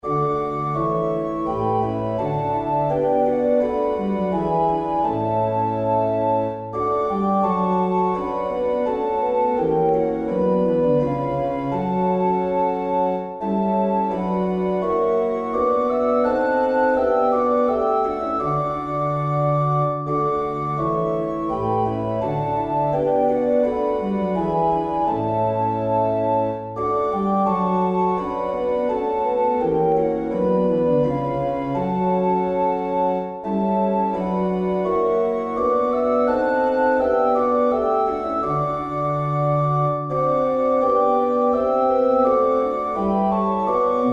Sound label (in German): Herzlich lieb hab ich dich, o Herr organ